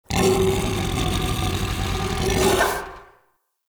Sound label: Battle Dog Enemy Spotted; Animals; Vocals